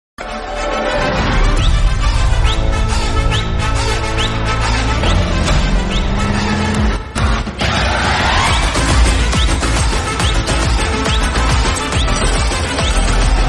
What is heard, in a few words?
bgm background theme background music; minnal murali